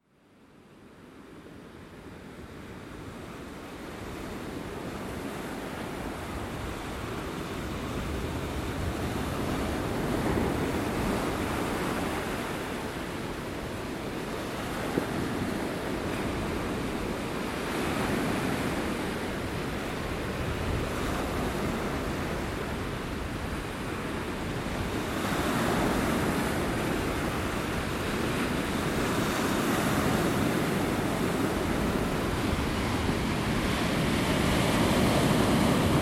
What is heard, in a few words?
soundscape Clevedon waves, A few minutes of the sea at Clevedon Pier.